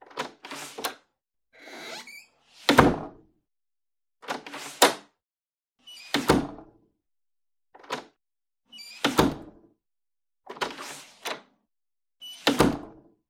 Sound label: Front Door Open Close